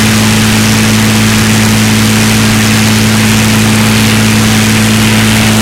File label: mustang side, engines; War Thunder